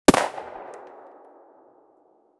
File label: single mp5a3 9mm gunshot